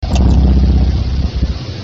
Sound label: indiv wings vswift